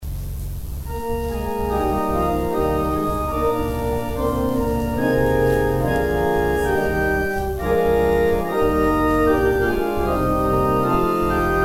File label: organ interlude